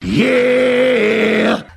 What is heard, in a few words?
"yeah" SUNG